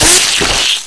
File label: grind flesh, manhack; hl2